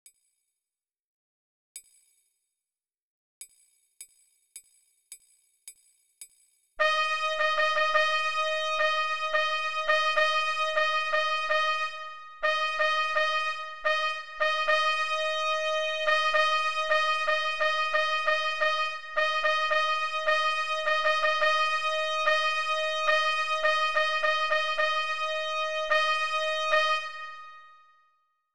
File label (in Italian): dettato ritmico